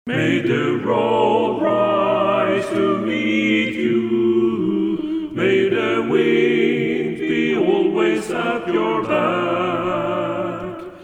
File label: TUTTI